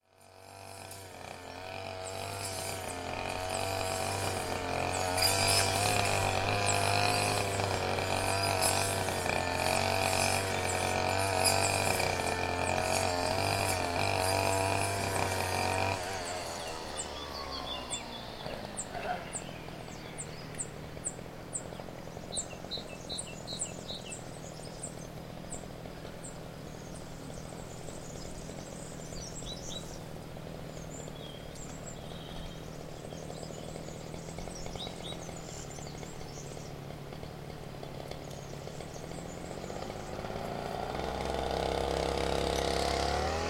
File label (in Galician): corta erva; Moldes; Casal